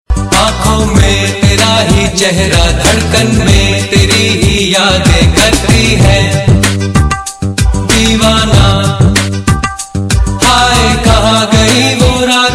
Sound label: Love Romantic Song